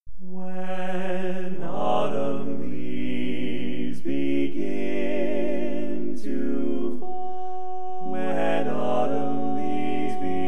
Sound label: Lead, Classic Tags